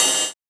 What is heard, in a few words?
Cymbal, jungle breaks drum sounds; ORIGINAL JUNGLE BREAKS